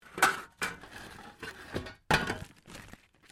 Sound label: METAL SCRAPS MOVE